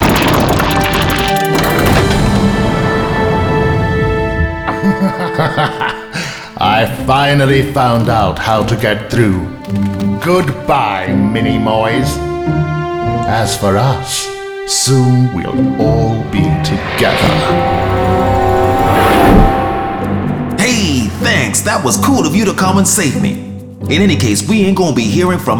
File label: Outro Victory US; arthur and the revenge of maltazard; wii